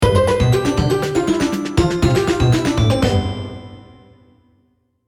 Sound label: jingle